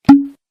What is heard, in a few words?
Message Notification; Whatsapp